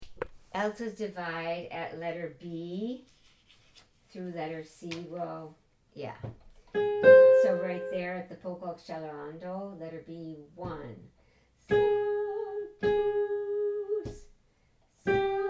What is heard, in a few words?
alto; mofc sanctus